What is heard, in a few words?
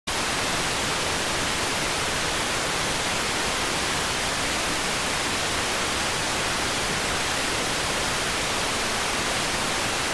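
radioteletype receive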